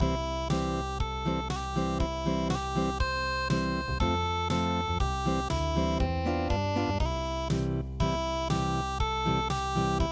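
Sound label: spring stream unplugged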